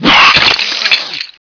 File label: zombie idle; zombie soldier